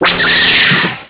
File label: bonus laser; pang